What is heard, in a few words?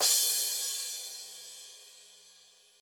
Cymbal Sound Sample A Key, Royality free cymbal sound clip tuned to the A note